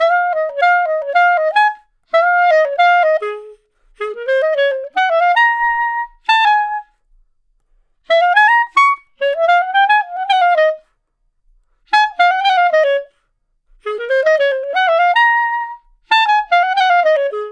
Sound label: Clarinet Solo; Sonic Foundry (Sony Creative Software); Jazz Solos and Sections